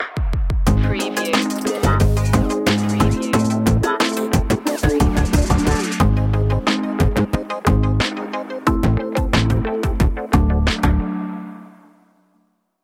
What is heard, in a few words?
freaky game stinger, chip tune